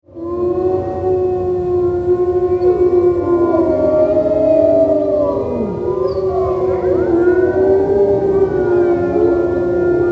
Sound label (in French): loup; loto sonore; pour les petits; loto des animaux